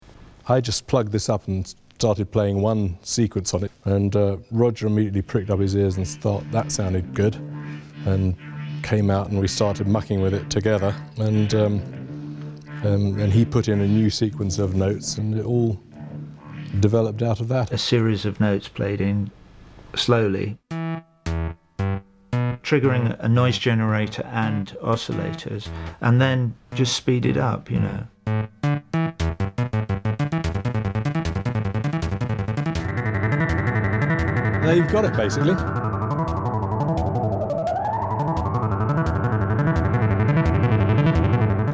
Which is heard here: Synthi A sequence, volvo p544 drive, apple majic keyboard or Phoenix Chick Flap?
Synthi A sequence